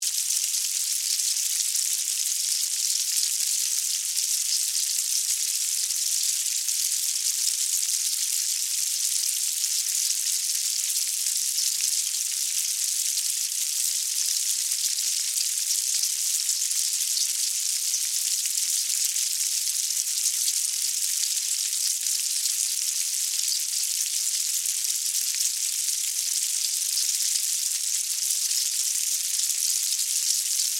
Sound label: Fire Ants, Colony, Bugs, Movement, Insects; Animal; Hornet Sound Effects; Blast Wave